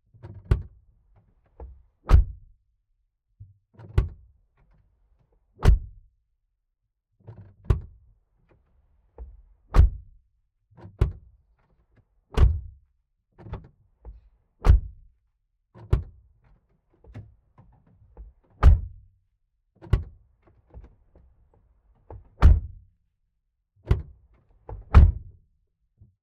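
vehicle door interior opel zafira open close drivers door near mono; Sonniss GDC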